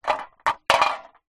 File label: drink can empty movement roll concrete ground